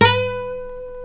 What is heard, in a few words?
B 4C nylon; HANS NYLON; Guitars